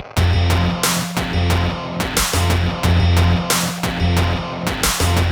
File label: Electro Rock, ringtones; Google; NewWave Labs